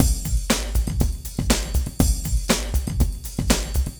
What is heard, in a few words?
BIG ELECTRO ACOUSTIC BEAT, PROCESSED ACOUSTIC DRUM KIT; Sonic Foundry (Sony Creative Software); Vir Unis Infusion